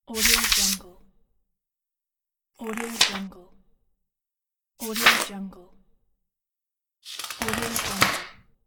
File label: turning a page; productionelements